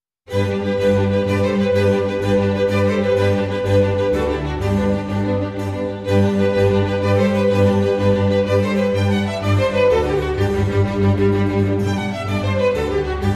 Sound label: Concert For Strings & Harpsichord In G Major - Presto; Vivaldi; Alla Rustica